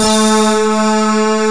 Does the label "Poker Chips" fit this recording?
No